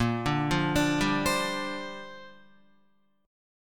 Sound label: A sharp 6th Add 9th, Guitar